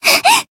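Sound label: Voice damaged, Japanese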